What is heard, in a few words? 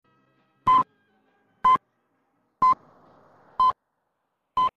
Pips